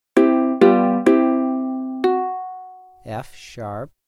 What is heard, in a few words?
F#3 Ukulele Major; ear training instrumental color